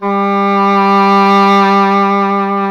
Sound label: E. Horn